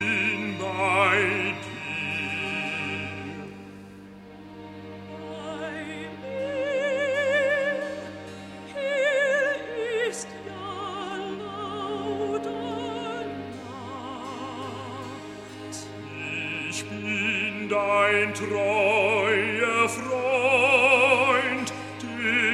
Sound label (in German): recitativo s b; ich hatte viel bekuemmernis; bwv 21; ach jesu meine ruh